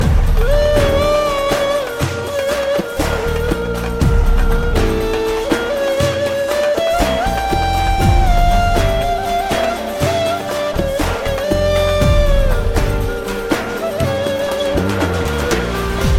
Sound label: Most Cracking Ringtone for Mobile Phone